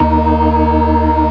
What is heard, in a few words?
organ, all stops; Keith Emerson Signature Series